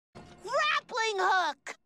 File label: grappling hook